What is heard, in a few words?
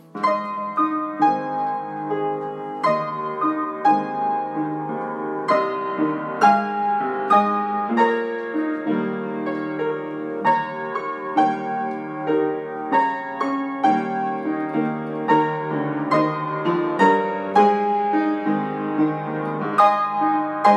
Allegretto in A flat major, piano